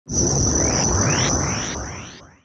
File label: magic wand